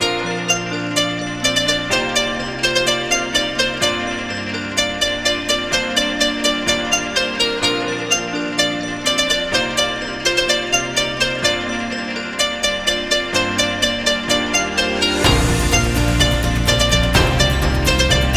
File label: Positive Asia